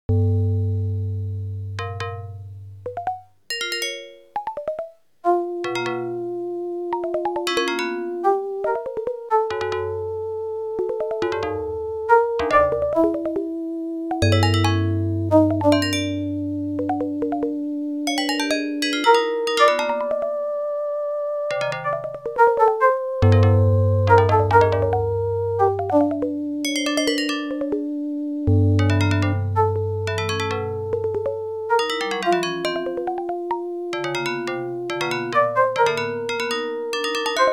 OPL3

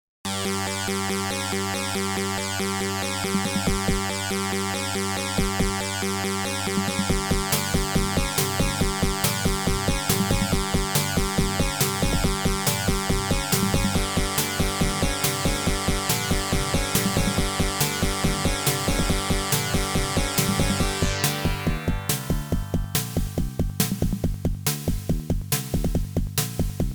OPL2; Action; Jill of the Jungle

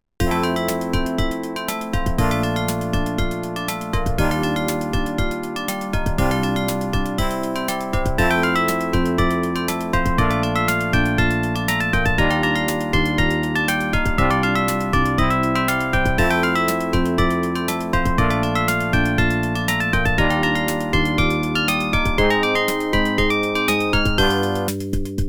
ADLIB OPL2

